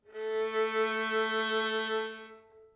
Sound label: Violin A 4 Tenuto Vibrato